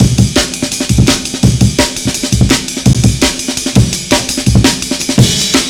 amen 168 mono, breaks

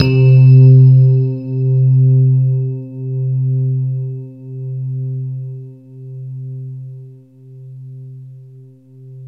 TINE EPIA, KURZWEIL; BASIC-KW2000

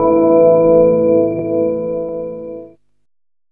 DR. LOOP, TRANCE; X-Static Goldmine 3

